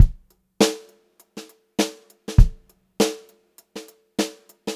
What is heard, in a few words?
VAR, Funk-Groove Drumloops